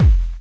bass kick

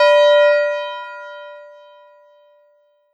bell D6; osu

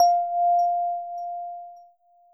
end chime

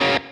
Staccato

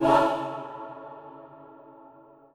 choir

